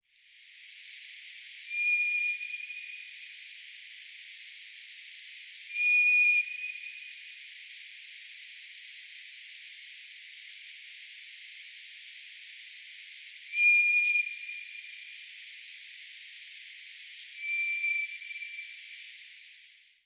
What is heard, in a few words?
tora, singing